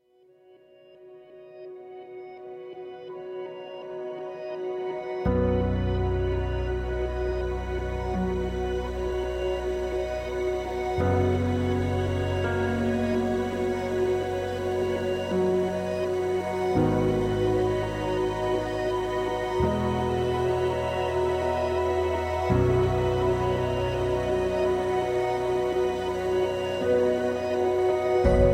Instrumental

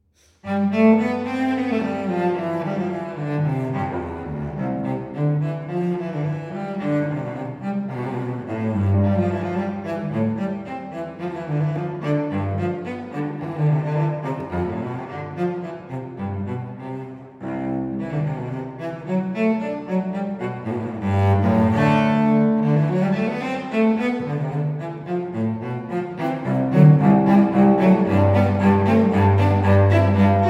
Suite in C Major, Allemande; Suite No. 3; BWV 1009

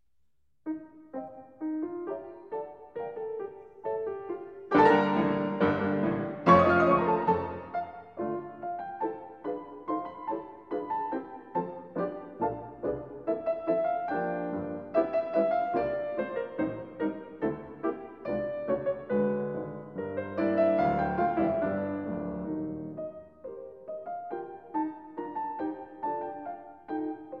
Piano 4 Hands; Satie 3 Morceaux en forme de poire; II. Prolongation du même

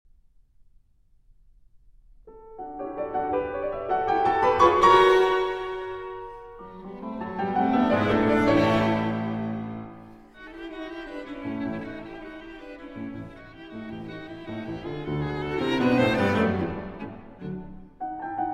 Piano Trio in D Major, Presto; Piano Trio No. 5; Op. 70 No. 1; Ghost; III. Presto

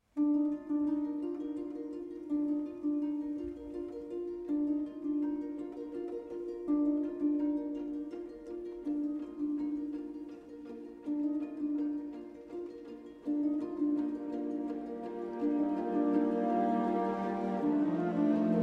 Solo Violin & Ensemble

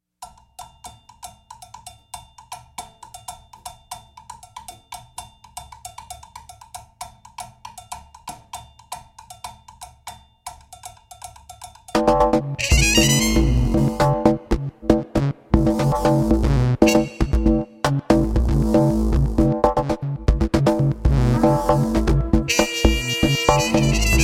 Upbeat

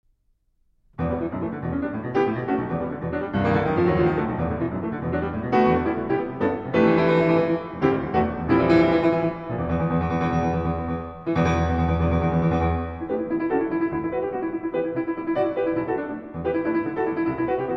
Concert Etude, Toccatina Allegro; Eight Concert Etudes, Op. 40 III.

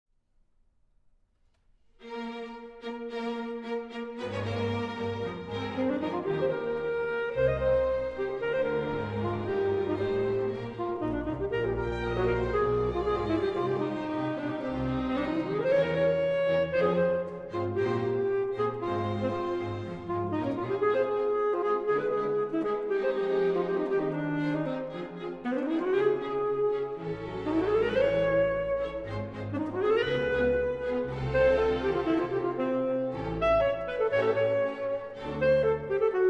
saxophone concerto

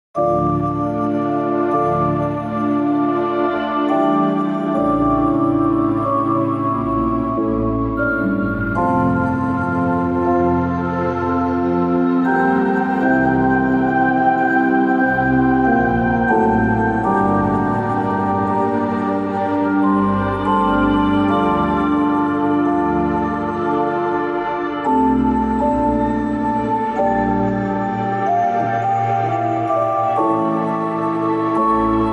Sarabande in D Minor; HWV 437

